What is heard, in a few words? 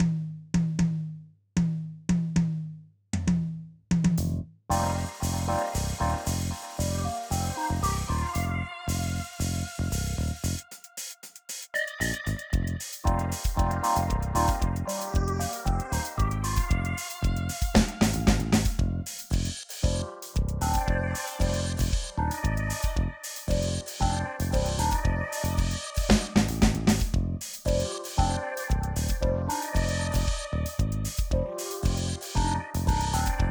casio elec pop 115bpm